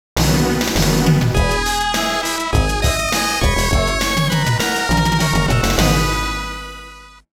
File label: OVER (Game Over); Taito Retro Game Music Collection 4; Riding Hero Cluster